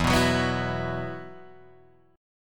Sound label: E Suspended 2nd E, Guitar, Drop D; 2,2,4,4,5,2; down